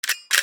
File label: collect